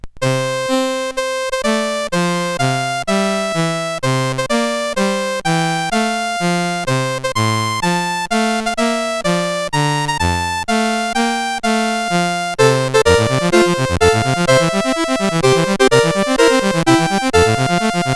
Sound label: Fireworks Celebration, CSG8580R5; Complete Computer Fireworks Celebration Kit